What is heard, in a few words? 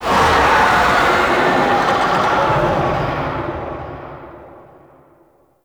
Distant Oni Vocal; Warframe; Cetus; Cetus Invasion; Ambience